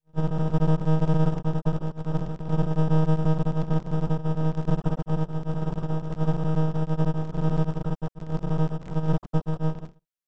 Noise Elect Thumping

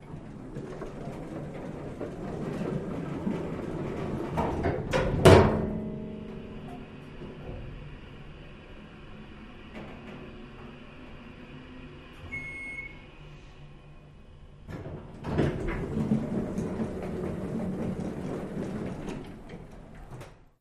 Elevator Door Close

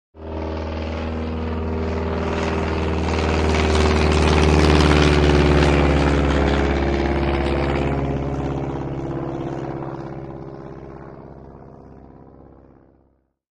Taylorcraft By Med

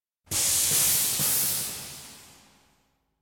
Air Hiss Pressure Re

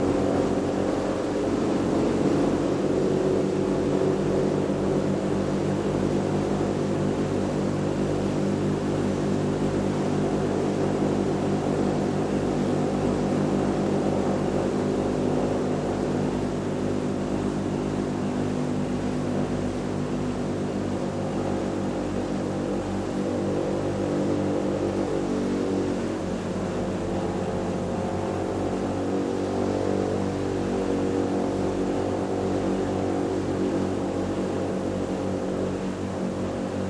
Airplane, Internal Sounds W Pneumatic Whines.

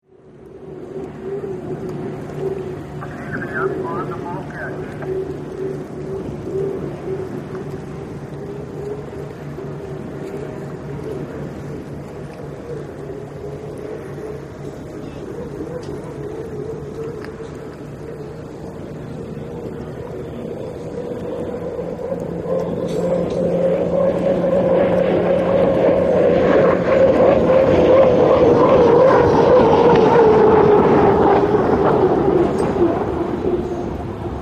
Hydroplane Dock Amb

